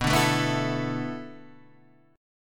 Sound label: B Minor 11th B down